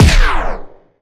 fx one shot tape stomp

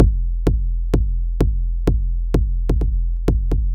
Drum Loops 128bpm Berlin Techno

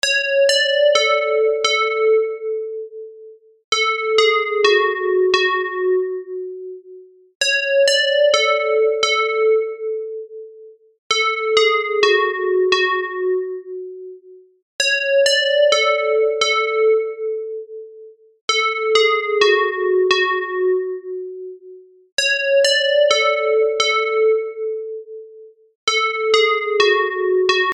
180 Gmin Bells

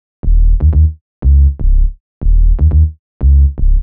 Bass 121bpm C m Synth Sub

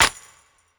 Lo Fi Jingle Clap; Freddie

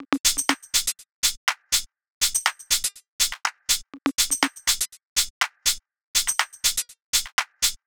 122 top loop groovy

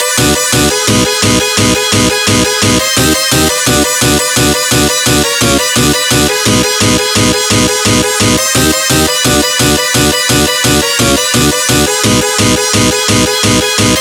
Synth loop

